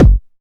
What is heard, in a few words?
Kicks Electro